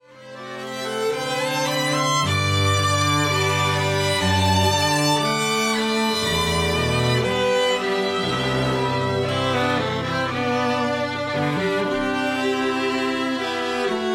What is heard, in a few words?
strings